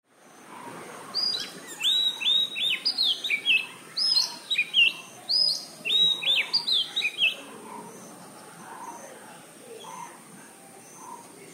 Magpie Robin; ZOOM

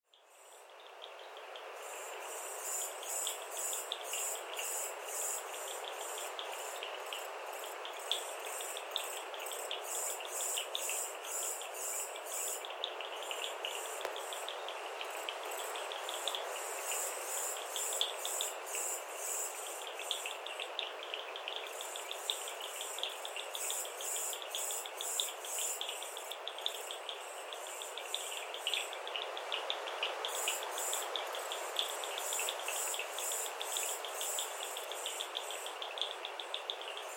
Knuckles night soundscape; Zoom XY st; Sri Lanka Wildlife Sound Recording – The locations

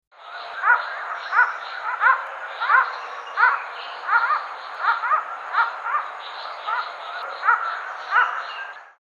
Collared scops owl duet; Sri Lanka

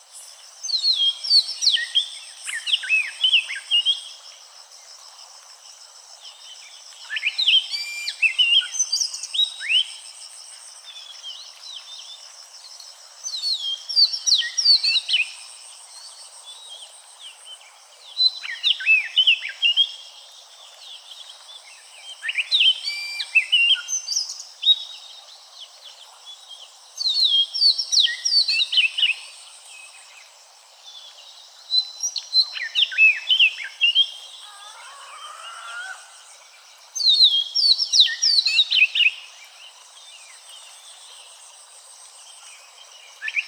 Magpie Robin Seenuggala Udawalawa

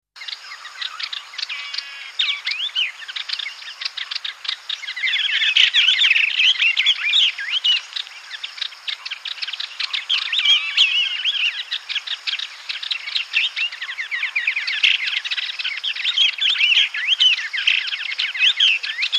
Yellow eyed Babblers scald song; Sri Lanka

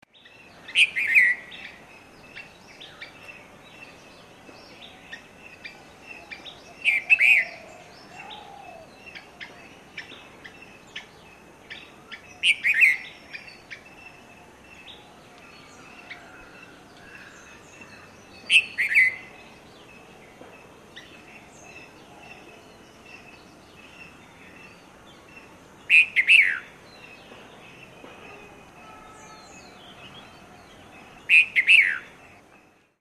Red vented Bulbul songs; two male Red-vented Bulbuls are singing from tree tops in the morning

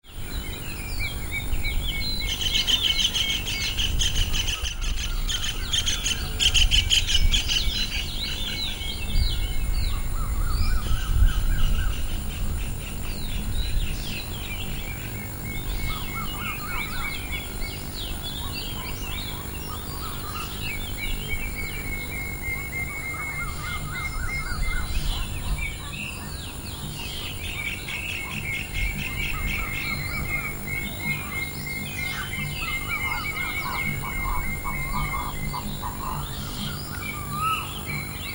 Dawn chorus with more birds

